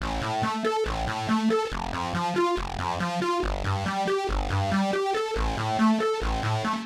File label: synth tekno 140 A; Techno2; Keys